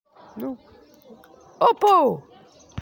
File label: Hoopoe